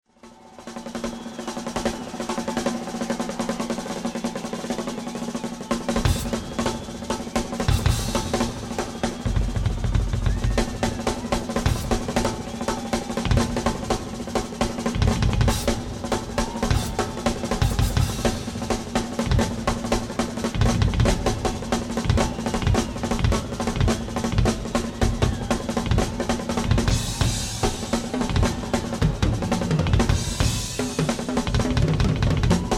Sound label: snare bass intro